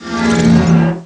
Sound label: ENGINE; Graliens